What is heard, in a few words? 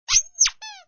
Squeak; Blue's Clues Blue's Checkup